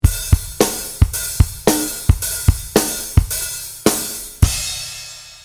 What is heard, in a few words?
alt rock loop, DrumLoops